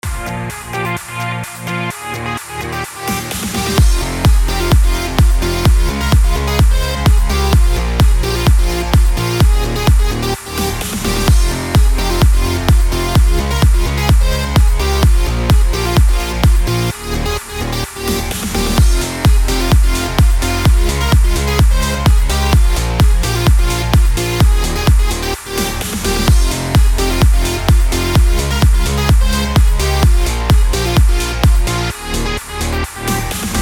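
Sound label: progressive house, F minor, 128 bpm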